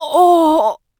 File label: Female Fight Death; mighty party 2017 gamerip pc ios android